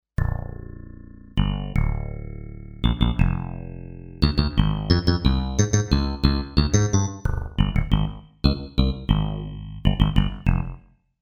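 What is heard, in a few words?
Anvil Bass, Kawai K5000S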